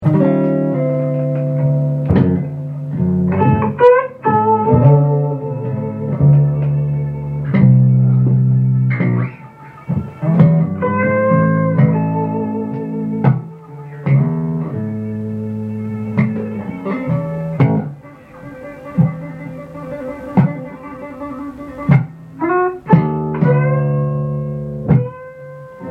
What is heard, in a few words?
Blues test